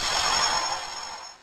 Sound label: Clanging Scales; pokemon reborn e19 2022